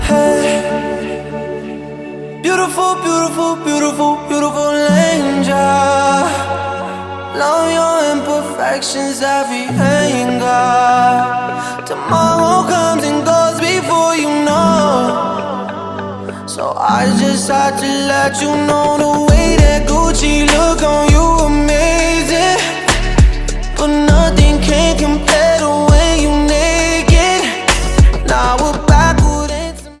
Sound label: Ringtone Singer Khareji